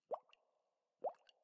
水下气泡声; ambient

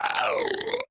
死亡声; 炽足兽

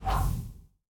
旋风人落地声

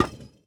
饰纹陶罐插入失败声

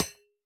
铜格栅破坏声

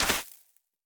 可疑的沙子踩踏声

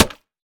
泥砖 破坏声

